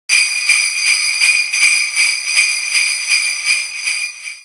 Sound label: Ring Sleigh Bells